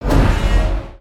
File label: scythe charge; weapons; aronwk collection; ndaudio